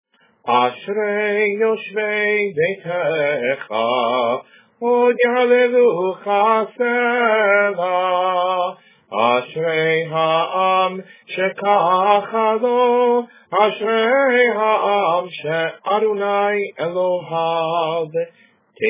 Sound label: ASHREI